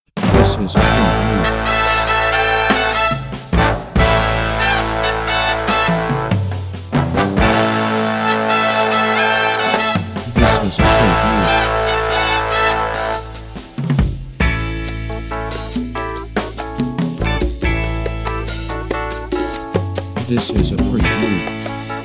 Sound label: Groovy Upbeat Retro Tune